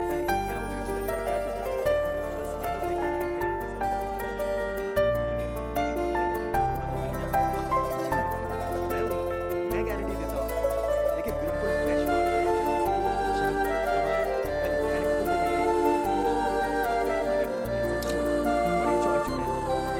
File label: Emotional